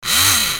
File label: Mermaid Servo Short